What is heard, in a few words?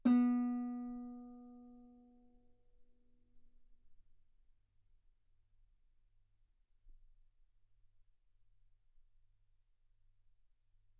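Guitar ff B string B3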